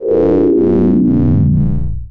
Science Fiction Sci-Fi Electronic Distorted Warble